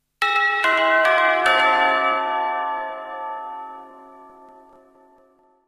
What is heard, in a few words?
Bells Sms